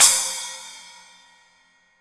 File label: Splash; Digital Sound Factory